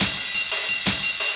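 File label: ride, perc loops, 175, drum and bass; EZRollers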